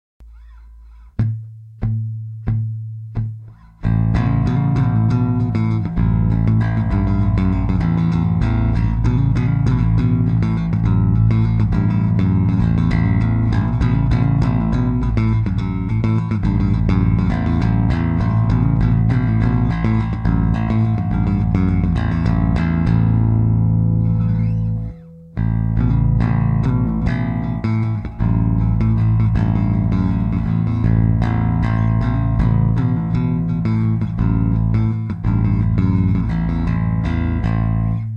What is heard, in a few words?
Open A string lick